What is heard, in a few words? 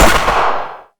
Gunshot; Hovl Studio; SFX Battle sounds; WhiteMan Unity2D